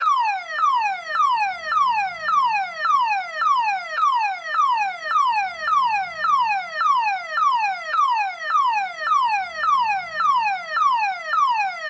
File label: Car Alarm; car alarms